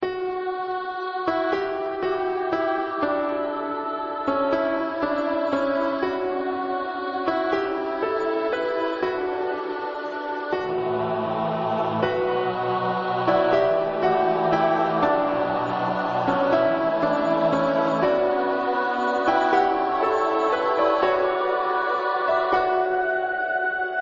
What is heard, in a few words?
song